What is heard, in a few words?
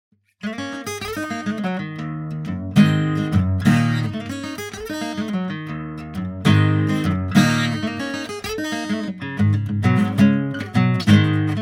maple pick, guitar